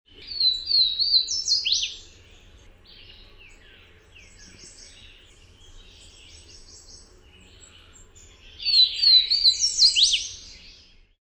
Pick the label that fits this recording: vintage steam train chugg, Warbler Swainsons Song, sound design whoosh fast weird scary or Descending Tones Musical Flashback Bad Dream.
Warbler Swainsons Song